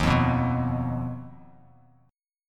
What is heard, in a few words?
Eb Suspended 2nd Sharp 5th, Bass Standard; Ebsus2#5 strummed; JGuitar